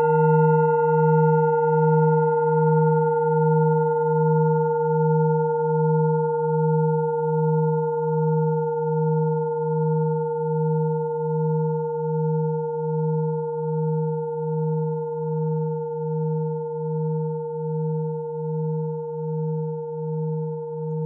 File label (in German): klangschale set